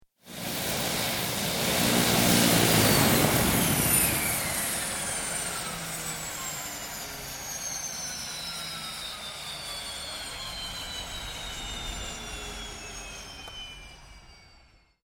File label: AIRCRAFT UNKNOWN TURBO PROP SHUTDOWN; Airplanes; Vehicles; Aircraft Collection